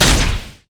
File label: twinbite dragon; LoL; shyvana